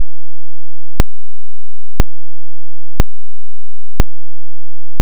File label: .5 Hz Square